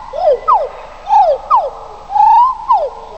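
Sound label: jungle loop; The Wild Thornberrys Movie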